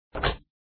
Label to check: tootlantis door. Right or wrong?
Right